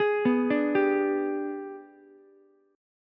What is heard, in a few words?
Ab Minor Major 7th Flat 5th, down, Ukulele; AbmM7b5; arpeggiated